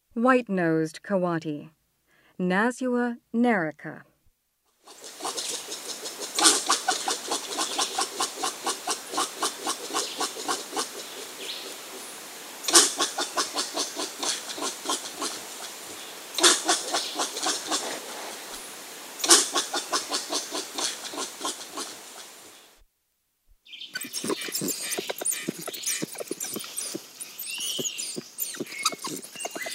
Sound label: White-nosed Coati; S. America